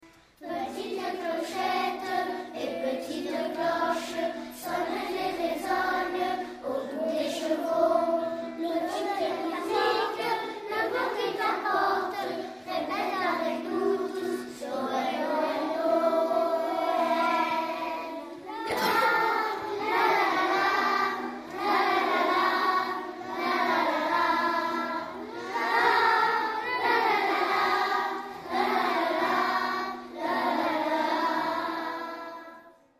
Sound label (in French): clochettes